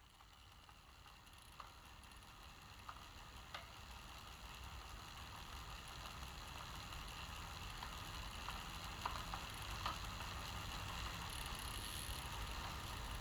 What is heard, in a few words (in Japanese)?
林道散歩ASMR; 「赤木南面千本桜」